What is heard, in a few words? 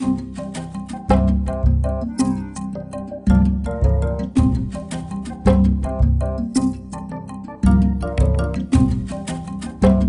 Jungle Level